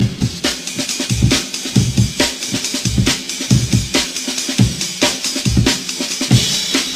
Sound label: amen